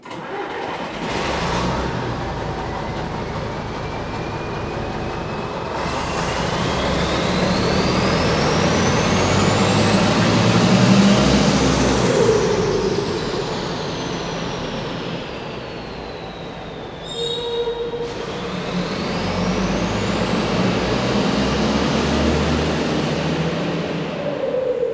bus starts; best service Blue Box

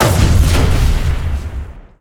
Explo Small Turret; Destruction

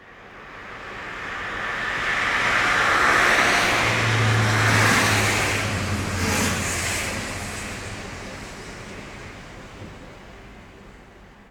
car on highway, street traffic; best service Blue Box

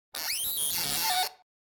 Beeps Sci-Fi Space Machine Beep; Hollywood Edge; sound designer's toolkit v2

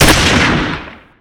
Gun Pistol Shot; SFX Kit; Weapons

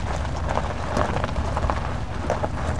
Auto Tires Dirt Loop; Automobiles

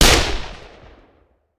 Machine Gun; Sound Ideas; Guns

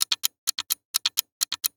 Timer Clock; Clocks, Watches and Timers; Blastwave

